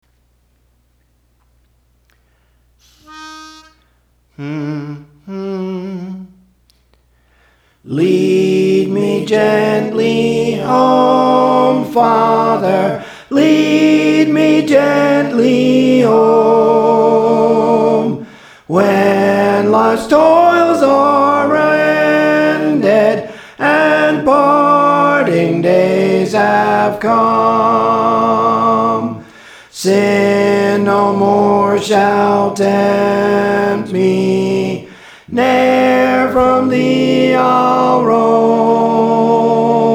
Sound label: Soprano; Praise For The Lord Training; Lead Me Gently Home Father